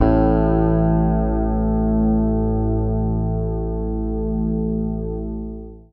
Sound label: Bass; Sampleheads; Will Lee Bass Library